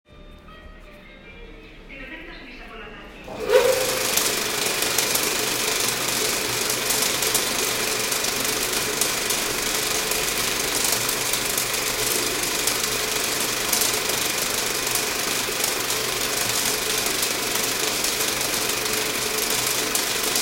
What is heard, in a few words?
Old Projector Starts Up